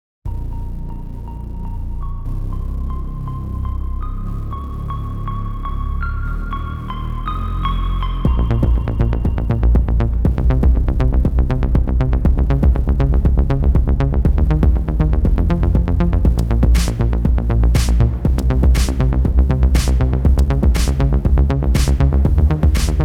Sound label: Electronic Brain; r sonances resonances 2022 workshop 2 2022; KOUNINE